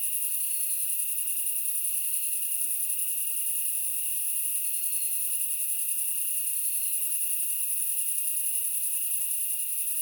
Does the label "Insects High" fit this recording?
Yes